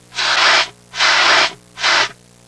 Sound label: fire extinguisher; Sound Effects for Multimedia - Volume 1